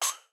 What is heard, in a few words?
synthetic puff